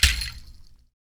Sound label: HAND, Afro Shakers, Ankle Pods; Roland LCDP14 Africa VOL-2